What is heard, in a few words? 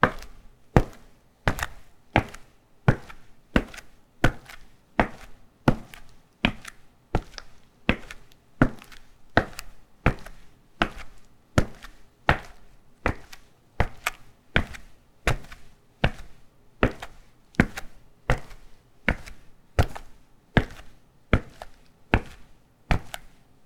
Footsteps Concrete Asphalt Street Walking